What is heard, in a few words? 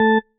marimba tissue; ゆめ2っき